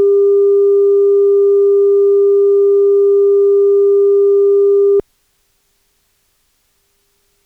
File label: 315Hz 0dB